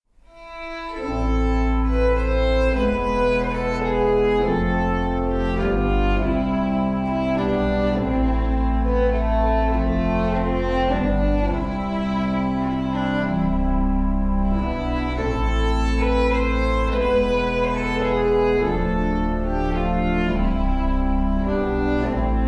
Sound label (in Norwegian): folketone fra sunnmore, instrumental